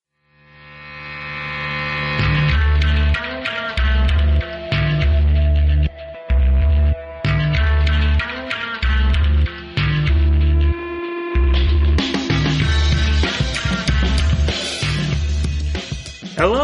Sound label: Toilets